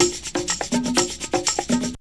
SAMBA 124, loop, BPM; 122-146 BPM

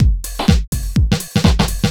HOUSE BEATS 125

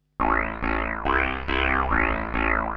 TEKNO 140, Techno; Techno2; Sphere

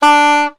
Soprano Sax Portato D3; Orchestral; DirectWave; Varazdin Orchestral

